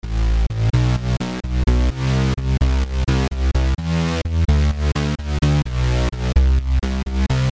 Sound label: Bass